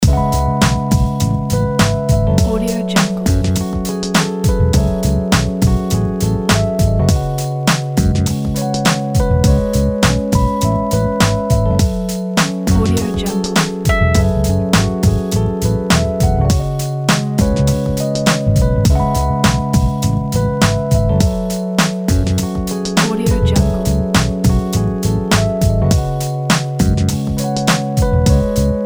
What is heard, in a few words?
downtempo lounge loop; robustbeats